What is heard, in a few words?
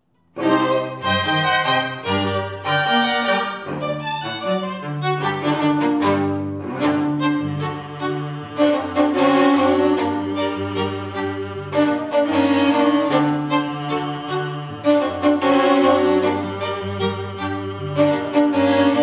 String Quartet, -; Winnipeg Canada